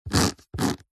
sport rugby ball scrape scratch surface with finger as if removing mark; zapsplat